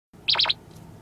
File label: police chime